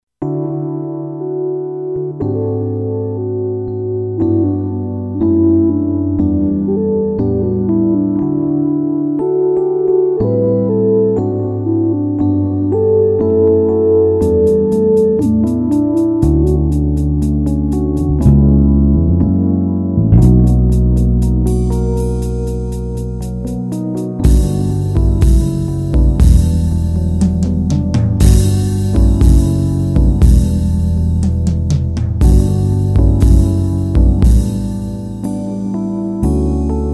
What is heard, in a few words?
lullaby 120bpm D Major RnB; beats